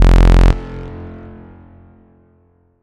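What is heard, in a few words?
acid house single low sharp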